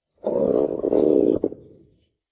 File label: stomach growling; royale high